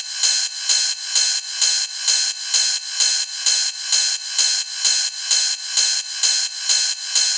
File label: Ride Loop 130, Techno; Techno Sounds 2024; Rides